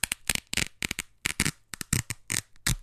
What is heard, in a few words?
Velcro Rip